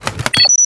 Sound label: mine activate, weapons